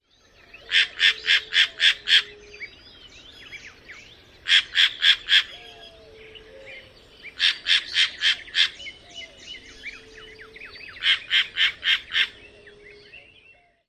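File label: harsh calls, green jay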